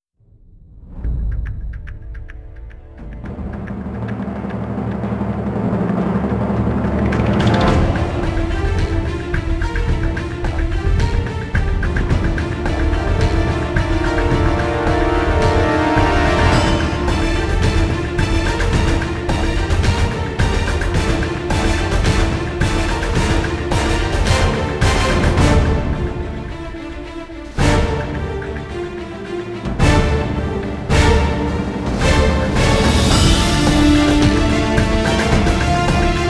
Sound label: manus dei non choir